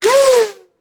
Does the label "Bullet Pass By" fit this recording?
Yes